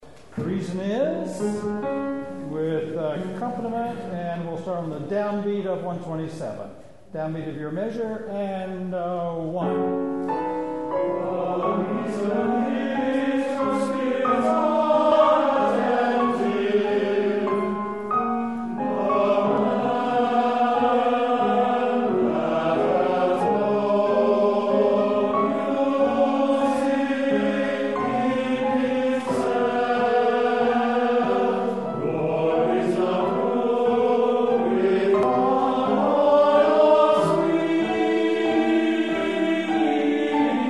Bass; Serenade

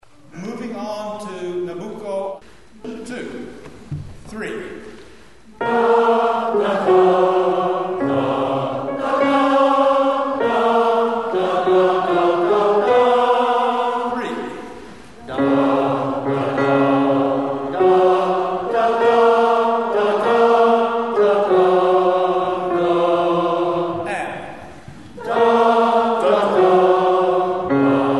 SATB notes; Nabucco

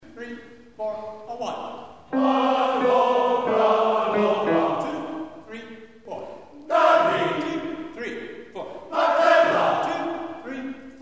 SATB; Anvil

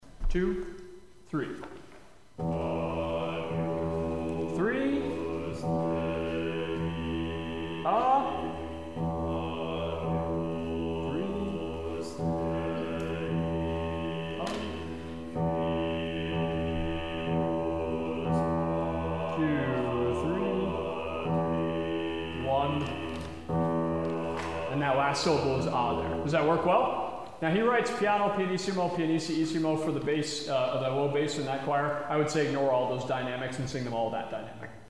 Bass Choir; rehearsal files

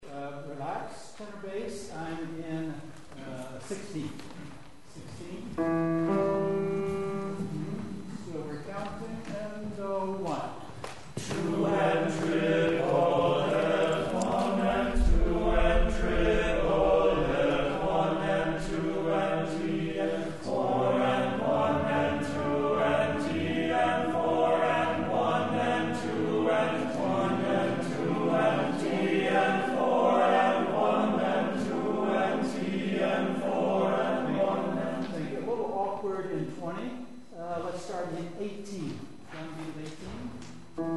TB learning

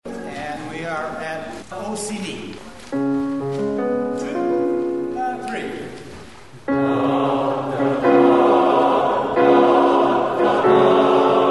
SATB singing notes; Nabucco

